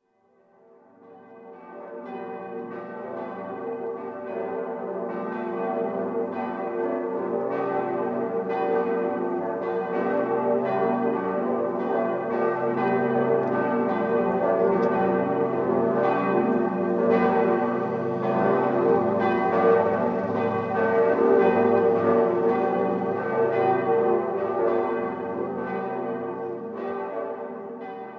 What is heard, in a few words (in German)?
glockengelaut